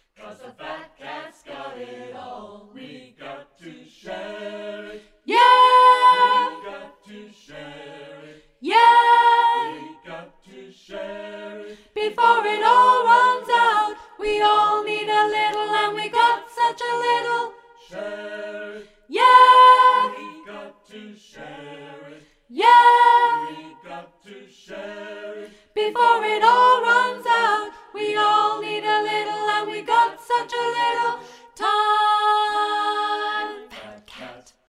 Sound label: Sop, choir